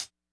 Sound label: hihat, Acoustic